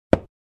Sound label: Single footstep on a hardwood floor high heels